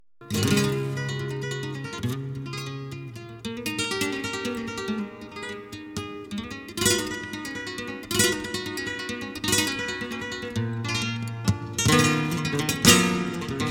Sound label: Solea